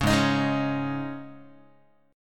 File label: G sharp Suspended 4th Guitar chord, down; G#sus4 strummed; 4,4,6,6,5,x; JGuitar